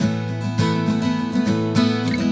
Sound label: Guitar A, Acoustic